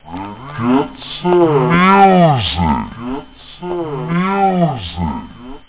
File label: vocal; da capo vol1; instruments